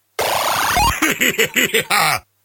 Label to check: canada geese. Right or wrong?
Wrong